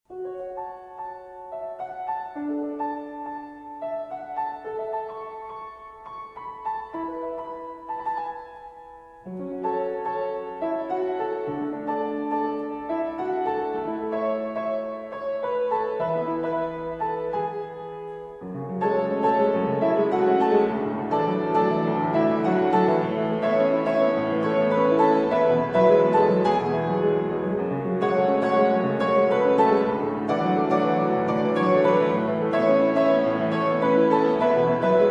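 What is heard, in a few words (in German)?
Flügel Grotrian Steinweg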